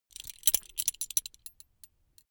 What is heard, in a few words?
metal belt buckle clatter long heavy